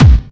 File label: hard bass drumz